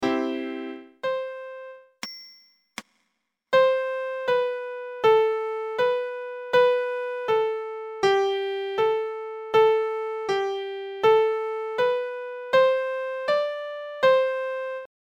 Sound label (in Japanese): ピアノ音声